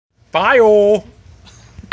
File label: fire